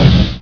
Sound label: gun; asciisec